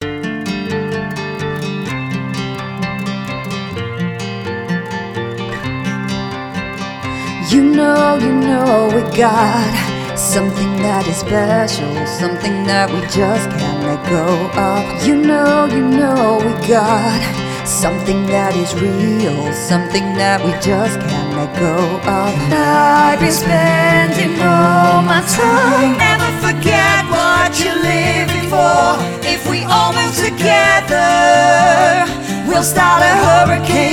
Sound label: Acoustic